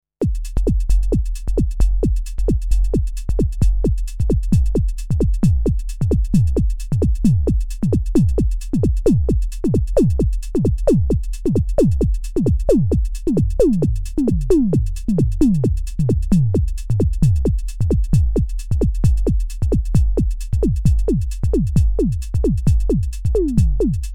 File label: Akai Tom Cat Kick Tom Swing